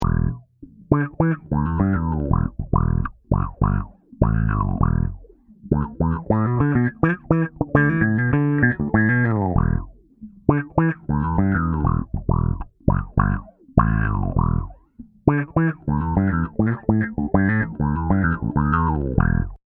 m tron

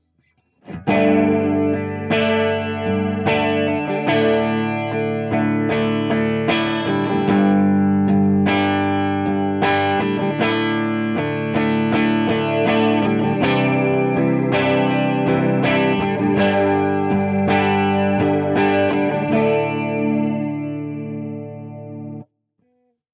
Chorus Depth; Beetronics Seabee

